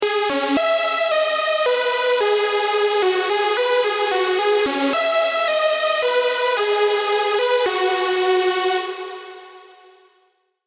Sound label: Tones C#m 110, Ambient Downtempo Melody; Ambient Downtempo MIDI Melody; Ambient & Downtempo MIDI Melody Collection